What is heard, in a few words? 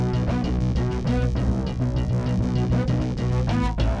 bass; musdb18